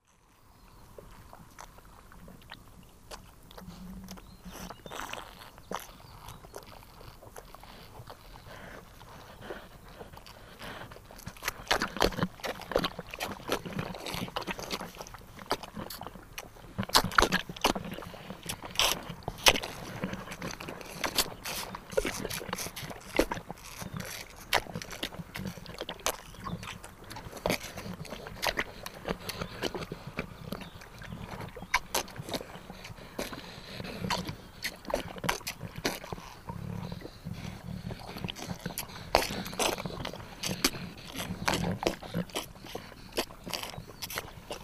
ram drinking quietly joined by ram who drinks noisily